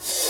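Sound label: Reverse Cymbal, Hip Hop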